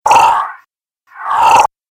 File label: Dubstep Scifi Effects